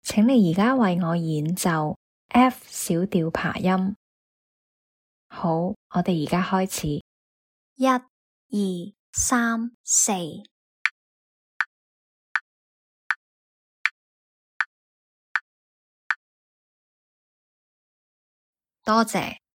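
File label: F minor arpeggio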